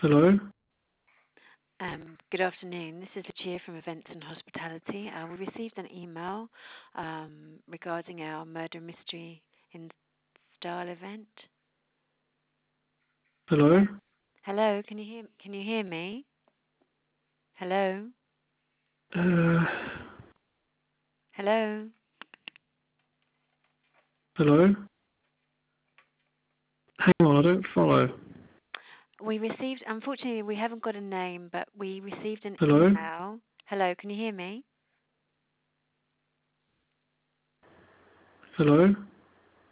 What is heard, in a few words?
silence